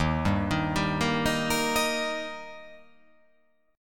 D sharp Major D sharp, chord

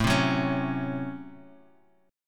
Db Major 7th Suspended 4th Sharp 5th A

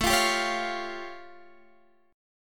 A Augmented 7th A Guitar Half Step Down

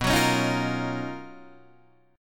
B Major Sharp 11th, Guitar, DADGAD

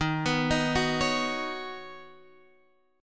D sharp Minor 7th Sharp 5th, Guitar, Standard; arpeggiated; JGuitar

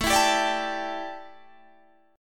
D Suspended 2nd Suspended 4th A

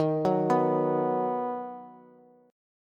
Eb Minor Sharp 5th, Bass; Ebm#5 arpeggiated; JGuitar

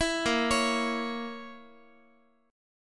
A sharp Diminished A sharp, Guitar; arpeggiated; 4A,4E,5A,5D,5G#,6C#; JGuitar

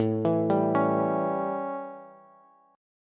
A 7th Bass, chord; arpeggiated; JGuitar

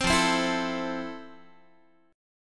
F Major 7th F, Guitar; strummed

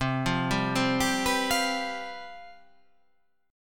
C Major 7th Suspended 4th

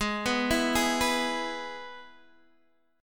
Ab Minor Sharp 5th Ab down Guitar Open D

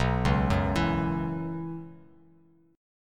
B Suspended 2nd Suspended 4th, Bass Standard; arpeggiated; JGuitar

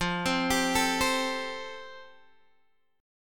F Major Add 9th, Guitar, Open G

